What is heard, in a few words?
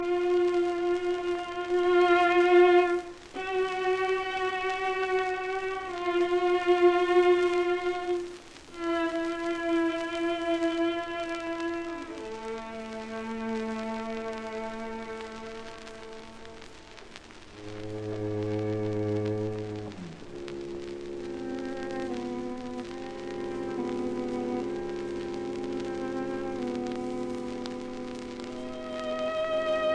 String Quartet Largo; Op 37; Schoenberg